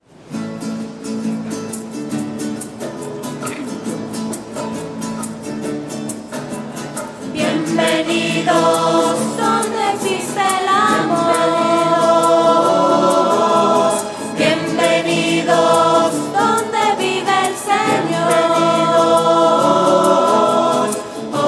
bienvenidos voces

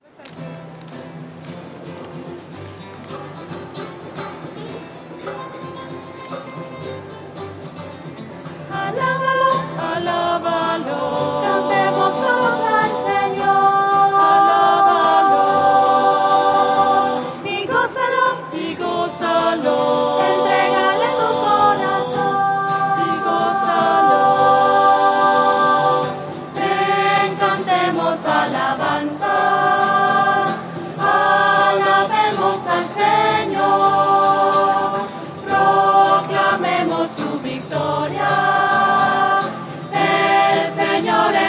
alábalo voces

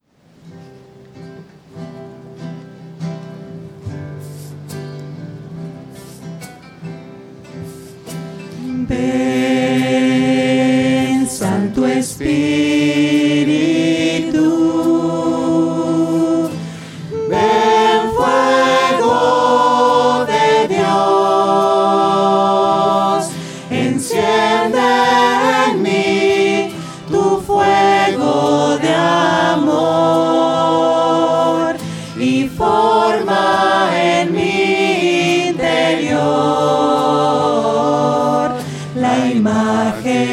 voces; ven santo espiritu; misa

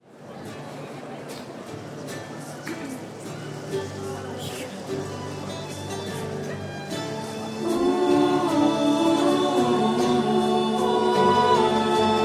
voces; misas 2012

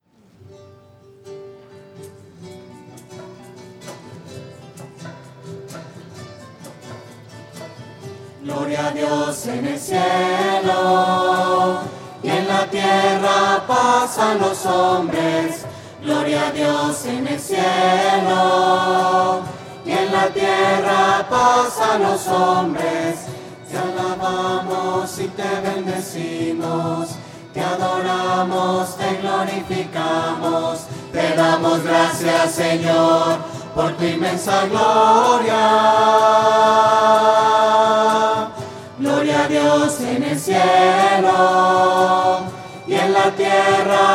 gloria voces; misas 2012

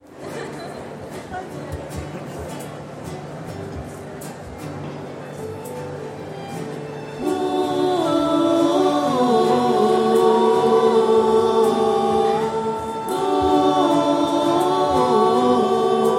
voces; misas 2012

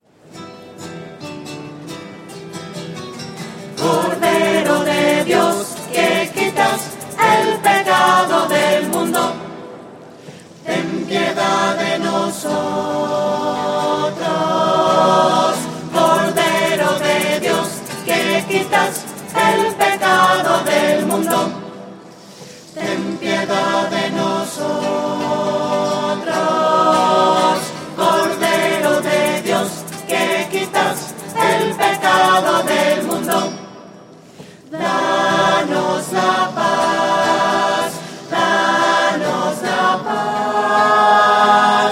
cordero de dios voces; misas 2012

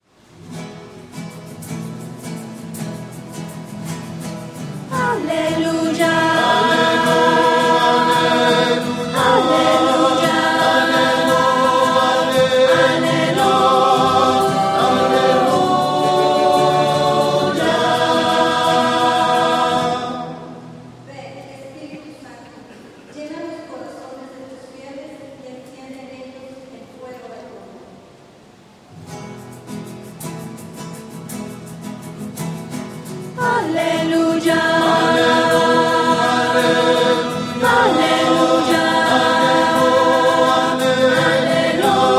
voces; aleluya en mi; misas 2012

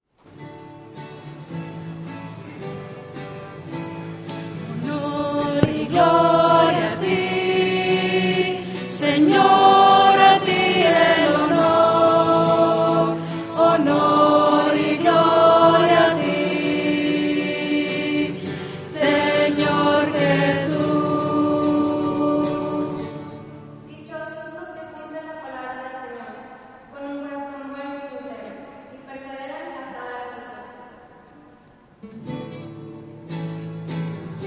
voces; misas 2012

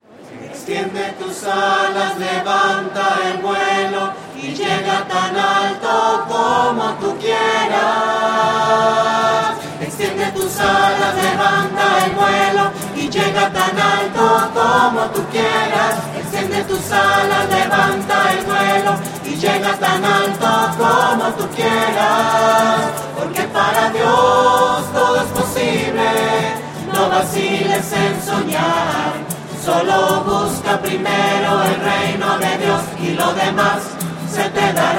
extiende tus alas voces

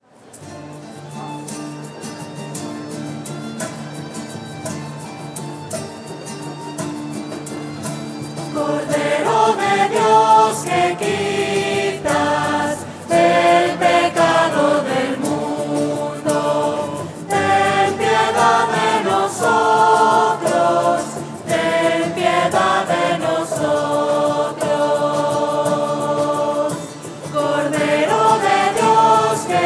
cordero de dios voces; misas 2012

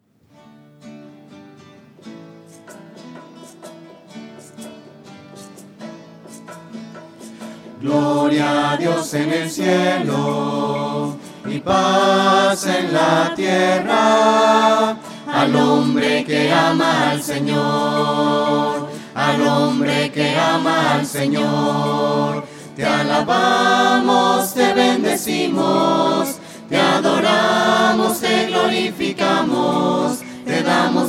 voces; misa

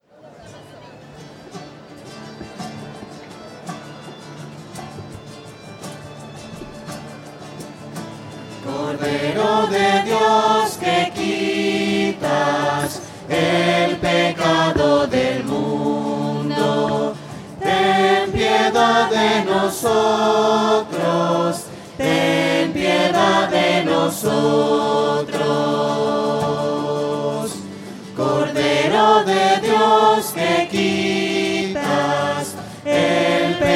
cordero de dios voces; misa

